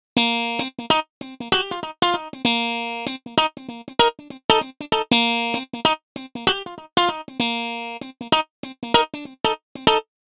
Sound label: Funky